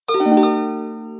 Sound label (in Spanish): magia; alquimistas